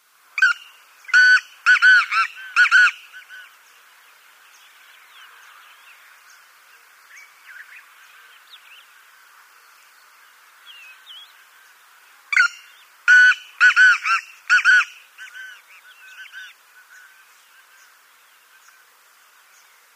Black Francolin male display

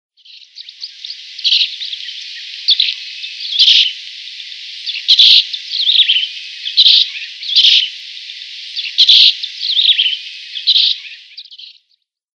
Plain Martin song

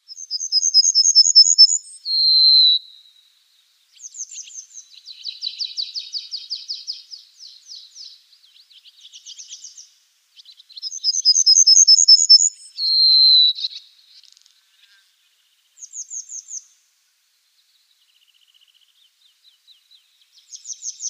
Pine Bunting song

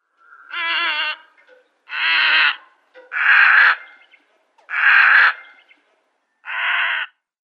Black-headed Heron flight call

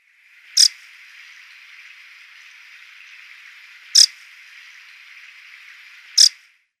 Red-breasted Flycatcher calls

